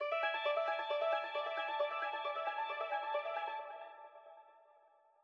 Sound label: Loop End C